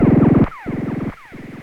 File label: monodelay - fm - 94, Loudest frequency 311 Hz Recorded with monotron delay and monotron - analogue ribbon; instruments; loops